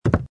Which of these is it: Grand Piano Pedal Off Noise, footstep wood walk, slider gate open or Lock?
footstep wood walk